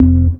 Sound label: tonga, Synths; Notes